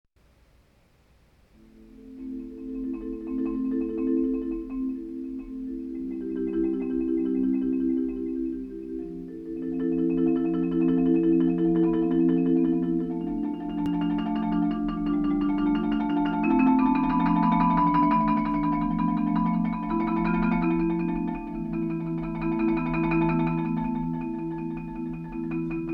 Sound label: Rhapsody for Marimba